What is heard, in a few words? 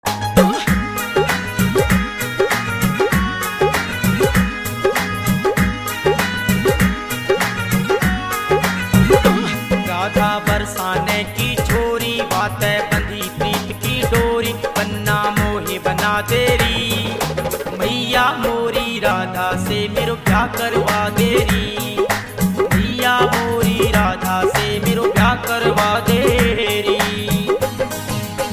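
bhajan remix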